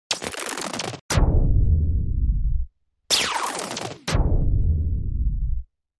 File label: Roll Up Bang Up